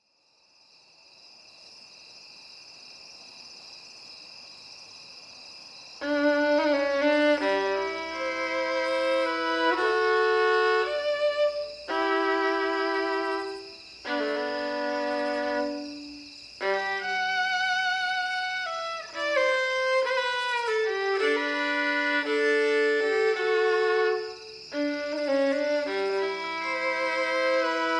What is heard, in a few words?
violin solo